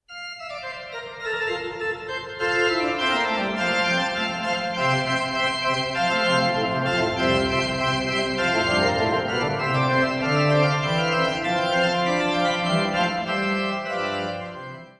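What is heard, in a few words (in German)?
Allegro Concerto F Dur, Orgel; Johann Sebastian Bach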